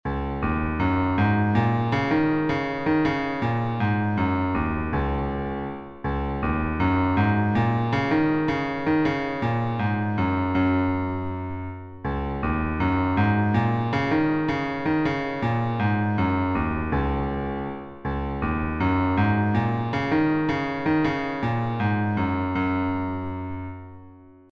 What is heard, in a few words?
Piano Pieces